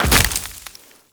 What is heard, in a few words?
Tenno Land Heavy Veg, footsteps; Warframe